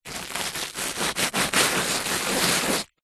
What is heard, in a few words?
toast wipe rub on surface